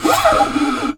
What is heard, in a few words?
Classical Guitar Extended Technique